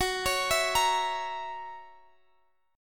Gb 7th Gb down Guitar Standard, chord; arpeggiated; capo=3

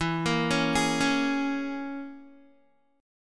E 6th Flat 5th E down Guitar; E6b5 arpeggiated

